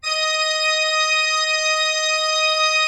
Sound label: D5, cathedral organ; Propeller Island